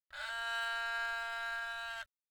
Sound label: haegeum; gugak